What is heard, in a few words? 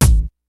DANCE KIT, DRUMS; Kid Nepro; Elite